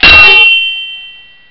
METALL

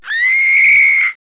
BIRD CRY

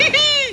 HEHE

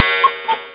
CUCKOO

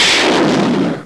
BOOM

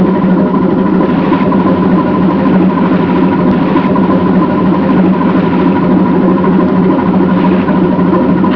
BOOT

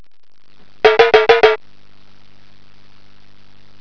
TAMTAM

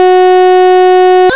ALARM

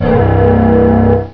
OMINOUS

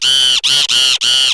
BIRD, CREATURE

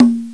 HI DRUM

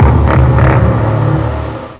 BASSOON

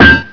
CHA CLUNK, cartoon

